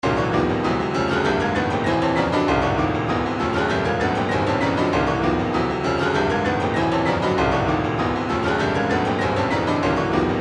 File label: retro funk 098 lofi; tude